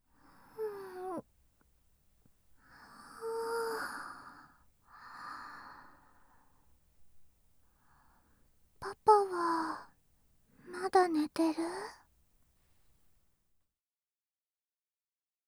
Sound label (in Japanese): おはようフェラ